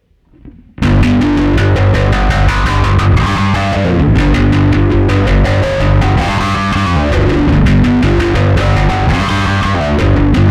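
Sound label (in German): ohne Cabsim, guitar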